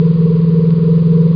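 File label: ambience; Nukem3d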